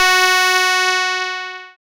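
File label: synth, Microwave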